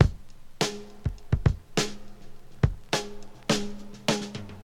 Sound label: 104 bpm drum loop f key, kick tuned to the F note. Loudest frequency: 1959Hz